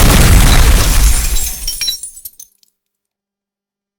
Car Explosion; explosion blast

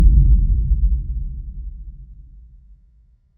Deep Boom Impact; facts channel

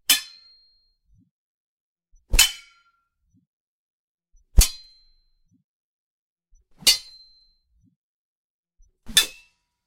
Sword Clash Sound; weapon

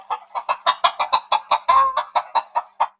Chicken Cluck; Village scenes

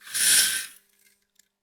Curtain Pull

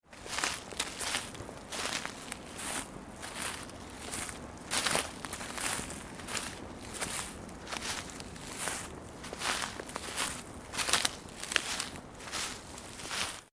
Footsteps on Leaves

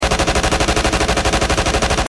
Machine Gun Rapid Fire; weapon

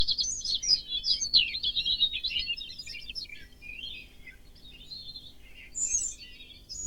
Morning Birds Chirping

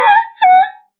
Donkey Bray; Comedy videos

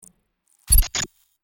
Data Error Noise, glitch

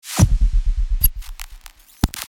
Digital Buzz Malfunction

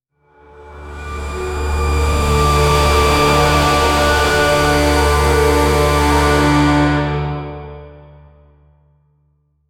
Cinematic Tension Riser

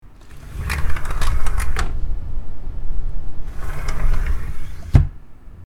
glass window sliding

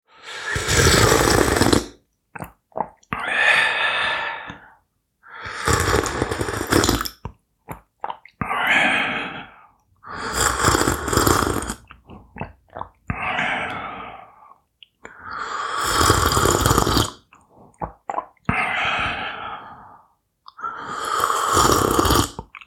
Sipping Hot Drink

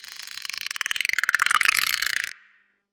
Dolphin Clicks; Ocean videos

